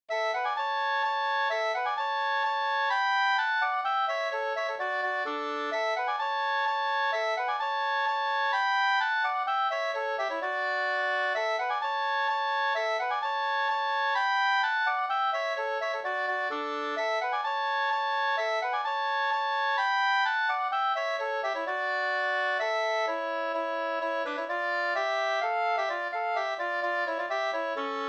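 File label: polska, two-part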